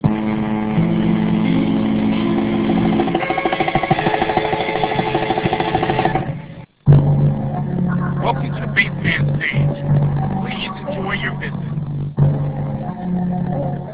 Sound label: bass